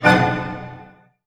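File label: F# diminished pianissimo, orchestra hits; Sound On Sound - Peter Siedleczek's Orchestra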